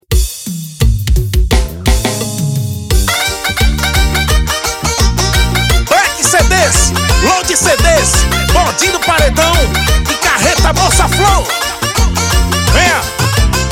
forro pizeiro

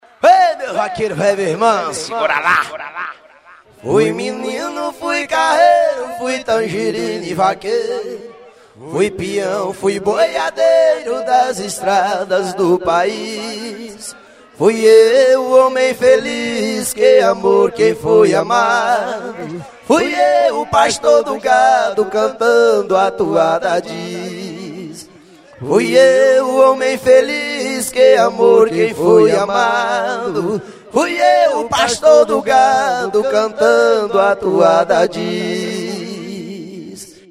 toada ao vivo, vaqueiro

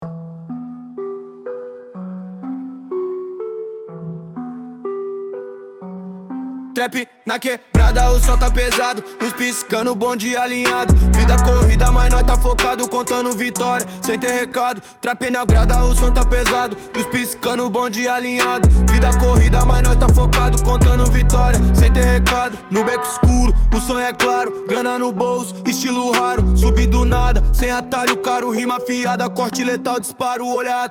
trap na quebrada

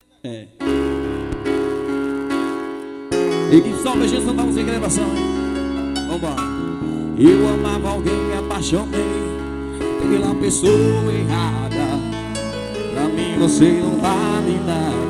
ao vivo medios graves; Pancada 1.000 grau; nailton cd's

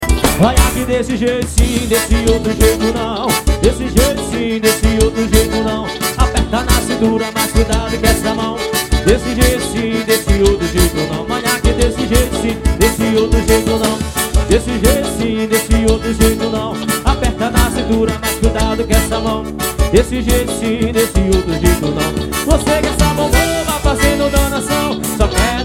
forró; manaus am

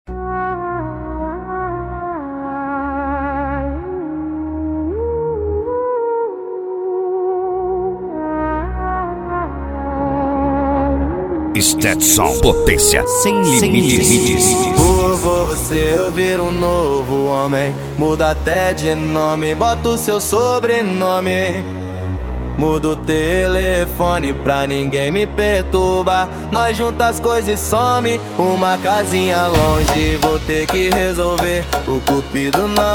dance comercial

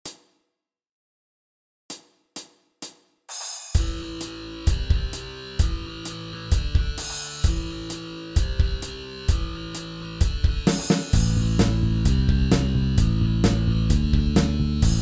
rock playback

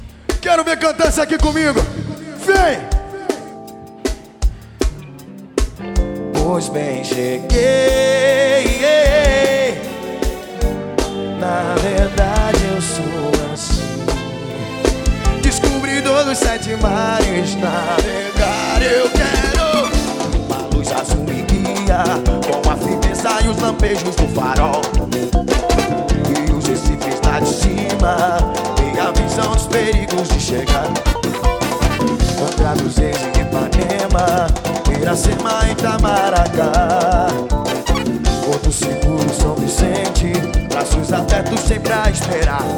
baile do pika pau ao vivo no trio

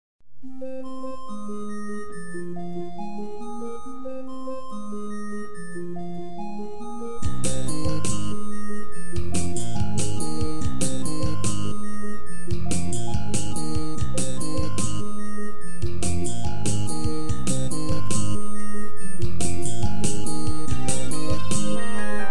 instrumental

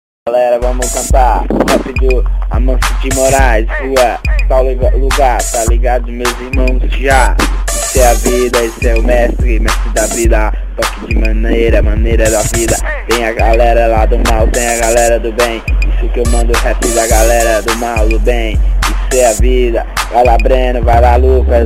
rap rua

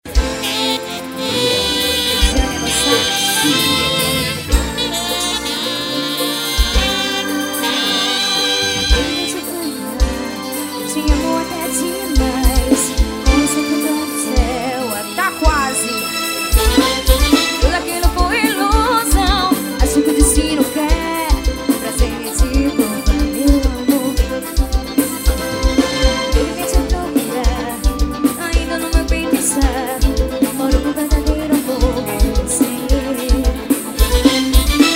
ao vivo no rest bar; fuxiko rest bar

